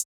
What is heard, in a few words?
Hi-Hat